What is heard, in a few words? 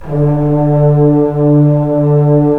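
brass trombones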